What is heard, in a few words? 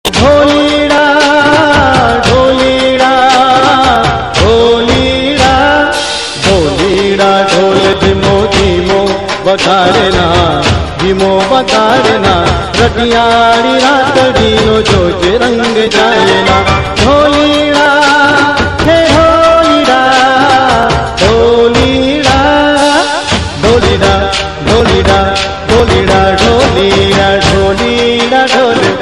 dhol dhimo navratri garba, gujarati